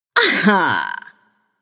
nv yay; smite; voicepack; morgan le fay

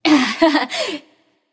"yay"; smite; voicepack; nuwa

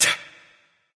grunt attack bow; smite; voicepack; ullr

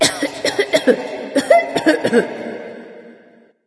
clear throat light; smite; voicepack; hel

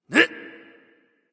grunt lift; Smite; Hou Yi

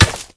pipe ground; smite; xbalanque

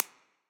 clap; smite

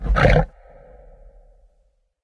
run breath; smite; Anhur

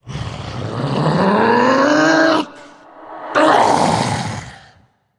battlecry; smite; thor

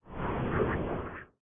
float; smite; thanatos

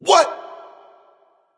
grunt hurt

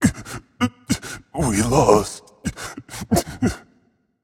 boo hoo; smite; achilles

